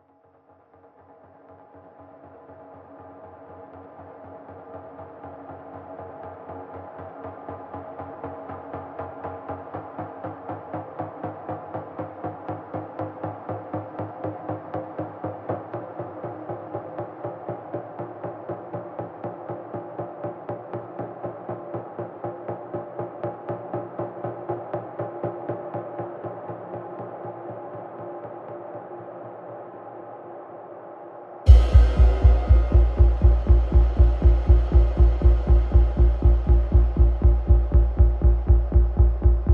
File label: Drums and Pulses